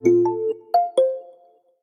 Shine, notifications; Samsung; Galaxy C7

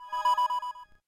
cam start; LG SU780 Lollipop 3

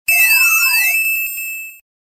Sonar Tone; Samsung SGH-i560

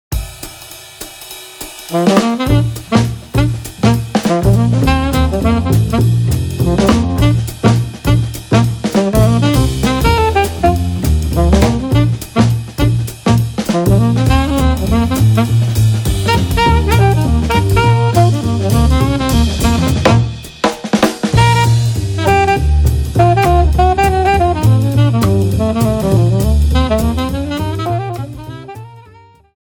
Trap Tap; HTC; Zoe video editor music

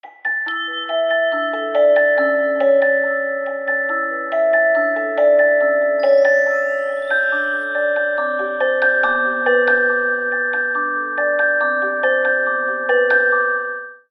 Melody, ringtones; Samsung; SM-W2019

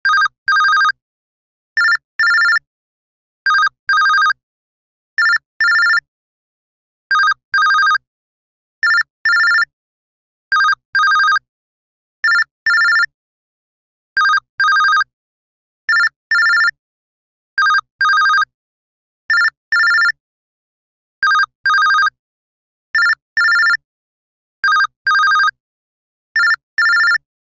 Simple Simple Bell, ringtones; LG Velvet

